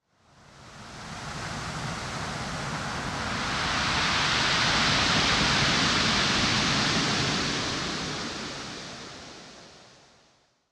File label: ambience ocean big wave; smite; conquest